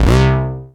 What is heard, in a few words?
MS20, Analog Bass; MS20 a Plenty; Ableton; Retro Synths; Analog Bass Vol 2